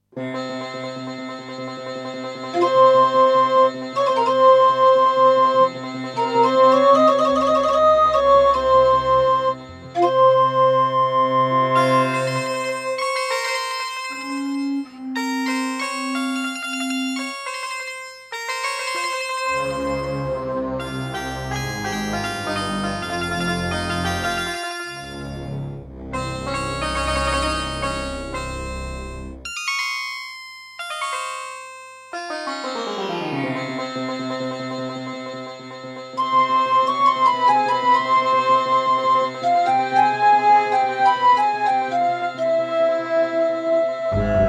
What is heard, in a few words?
Diamond Monster Sound 0.5MB; Prince of Persia 2 - Intro